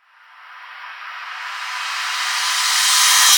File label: Reverse Crash; Cymbals; Vengeance Samples